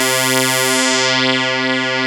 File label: 2600 LEAD C3, Analog; ARP 01; USB Soundscan vol.49; AKAI